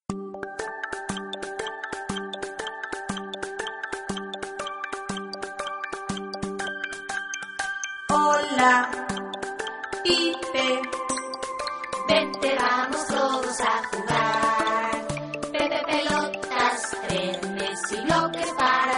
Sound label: pipe